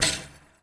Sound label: Street Ball Chain Rim, Street Basketball; SFX Kit